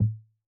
tom; bespoke - Floral v.1; drum oneshots

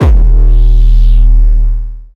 Bass

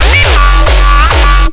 bassloop broken sustains c sharp 137bpm; broken sustains two; base pack

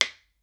percussion; bespoke - Floral v.1

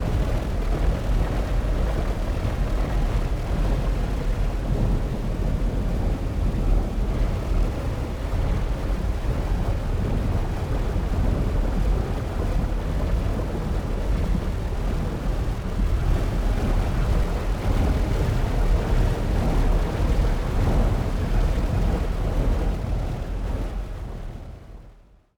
dark ambience; fx; base pack

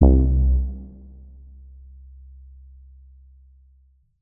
bass; bespoke - Floral v.1

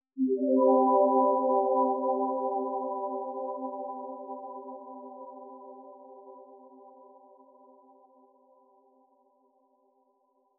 bg shimmer C Maj; fx; base pack

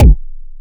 drums kick; base pack

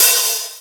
Hi-hat; Percussion; 7rystan's 600+ FREE EDM SAMPLES & DRUMS

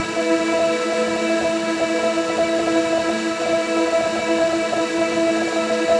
pad A, synths pads; favor; base pack

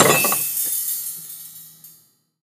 Metallic bang; Foley; SFX; 7rystan's 600+ FREE EDM SAMPLES & DRUMS

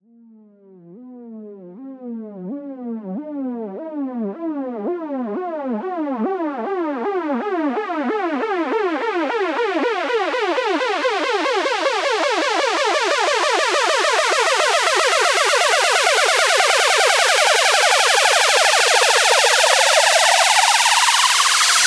Rise; SFX; 7rystan's 600+ FREE EDM SAMPLES & DRUMS

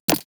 glitch perc; drums; perc oneshots; bespoke - Floral v.2

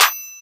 Clap; 7rystan's 600+ FREE EDM SAMPLES & DRUMS; Percussion

